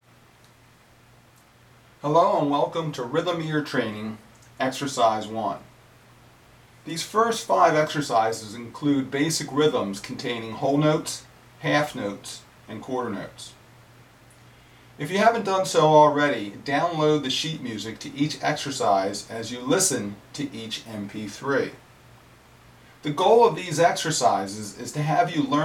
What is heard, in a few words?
Rhythm Ear Training Exercise